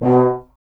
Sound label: Tuba, Solo Instruments; Miroslav Vitous